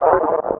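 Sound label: droid sentry battle; Star Wars Knights Of The Old Republic I; XBOX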